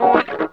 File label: WAH SNIP, Funk Gtr & Wah